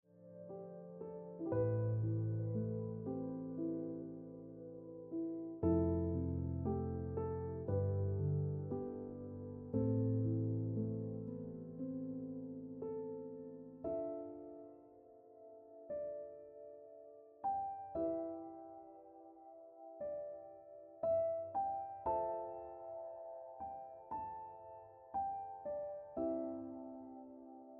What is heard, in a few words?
Ringtone Piano Music